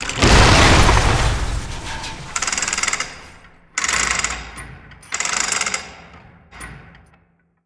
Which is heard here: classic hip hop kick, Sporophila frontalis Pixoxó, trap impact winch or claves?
trap impact winch